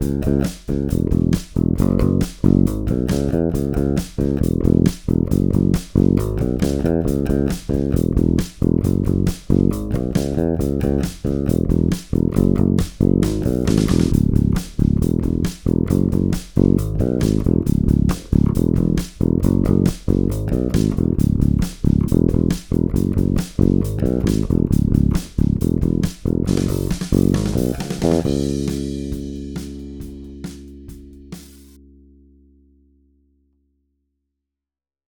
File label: rock